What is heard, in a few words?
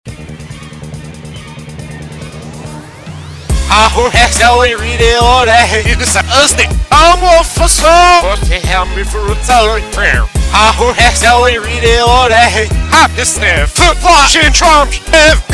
more backwards rapping